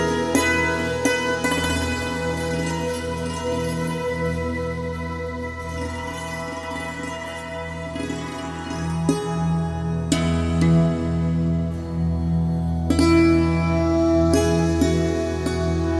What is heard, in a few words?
Yaman, hindustani raag